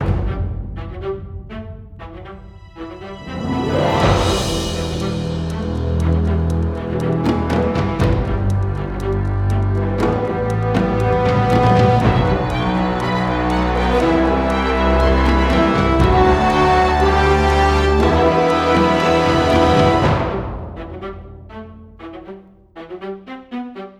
Cinematic Action; epic dumpster bear; wii u

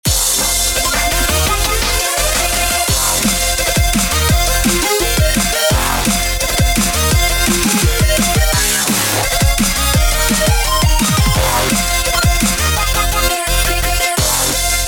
Coin Synth, dubstep; Move or Die

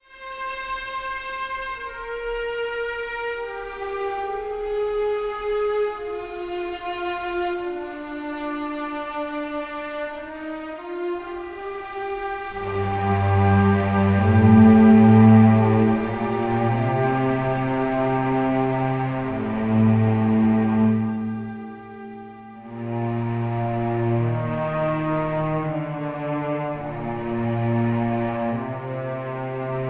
House Boat - Ambient, Strings Stem; ps4 ps5 switch windows xbox one xbox series xs

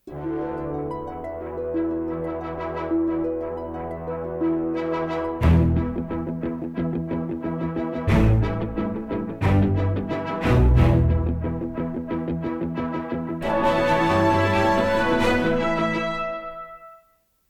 level completed; Cursed Gem

